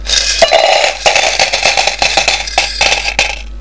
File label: Money; Finder Dungeon